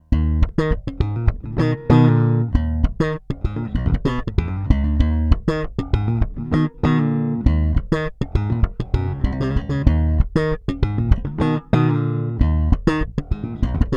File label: Both boost slap